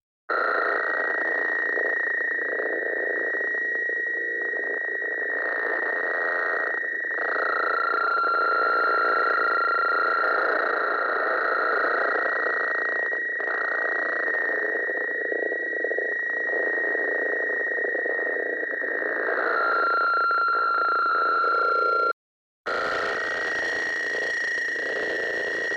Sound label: Comstatic Bad Radio Static Interference Failures Malfunctions Artificial